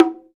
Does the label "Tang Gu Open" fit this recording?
Yes